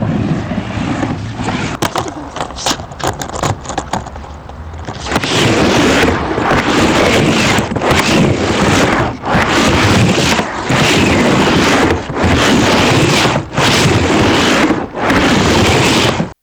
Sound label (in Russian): skejt